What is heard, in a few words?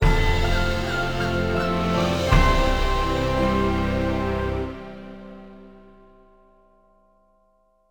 New Unlock (Jingle); mario tennis open